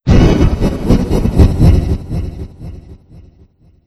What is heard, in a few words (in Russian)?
Smeh; horror